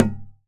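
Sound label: Percussion Tabla; Polyend; Simulacrum Sounds; 1 Shots; Acoustic and Electric; Drums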